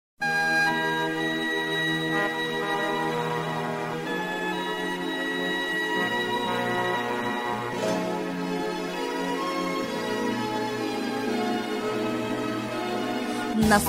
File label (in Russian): s vokalom